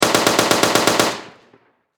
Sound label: Warfare Machine Gun 7.92 Caliber Mp44 Stg44 German Long Burst Distant Perspective